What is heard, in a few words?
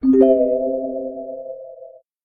Daydream, notifications; Galaxy S9